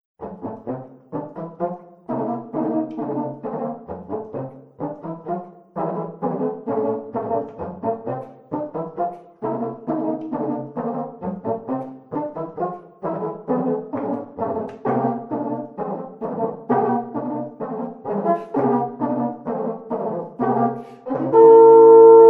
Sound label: Serpent Trio